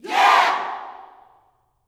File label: "YEAH", YELL SHOUT Gospel; ILIO; Vocal Planet